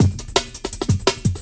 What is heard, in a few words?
BRK, JUNGLE; M8JUNGLEPAK1